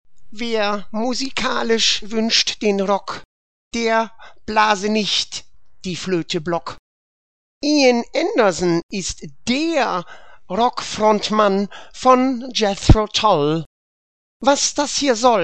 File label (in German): Blockflöte versus Querflöte